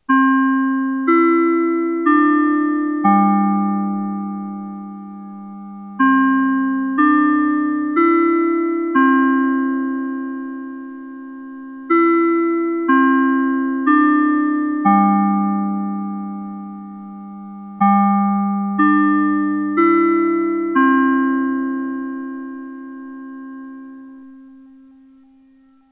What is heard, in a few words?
westminster chime